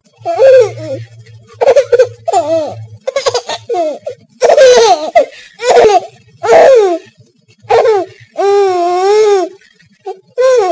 Kickern

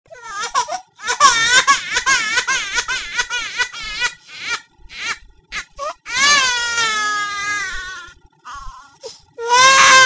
wah, Klinik; 2000-06-13 3.Tag in der Uni-Klinik

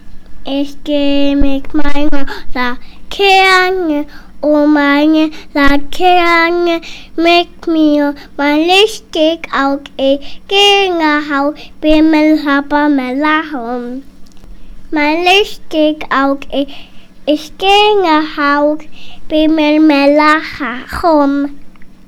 laterne, Laternenumzug; Laternenumzug im KiGa

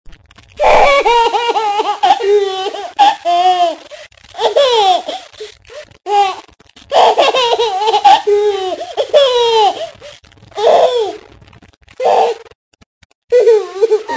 Lachen, Kinder

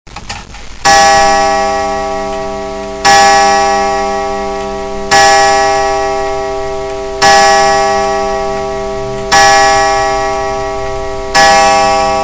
Regulateur, Grossuhren

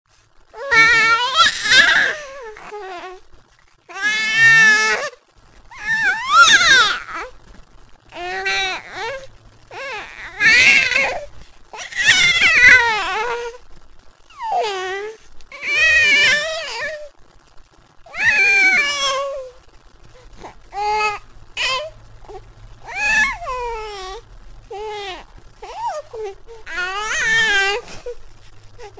Krietschen

